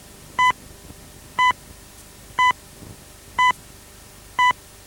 vhs digital clock; Sonniss GDC

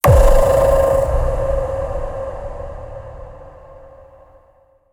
paranoia impact; sonniss gdc

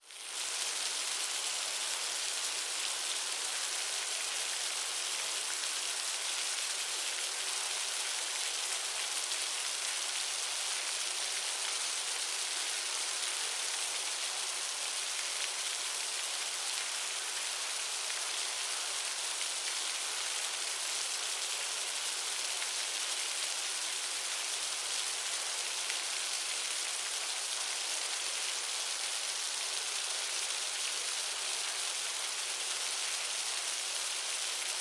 river dam light; fienup

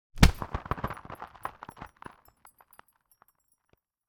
melee attack impacts magical effects cold

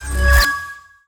spawns portals teleports; sonniss gdc

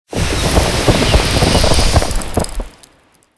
cone of cold; 5th level spells

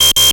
alarms construction kit element; fvtt sonniss gdc audio

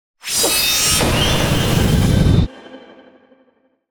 ranged magic beam; psfx

